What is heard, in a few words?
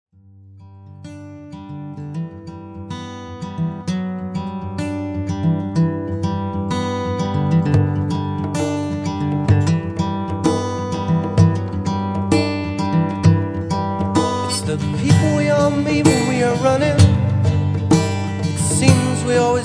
Acoustic Track